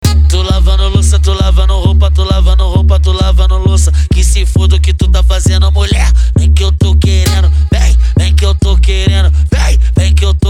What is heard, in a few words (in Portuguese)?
funk mega bass e forro; urupa ro